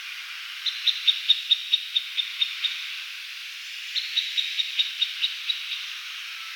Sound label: Middle Spotted Woodpecker, Mittelspecht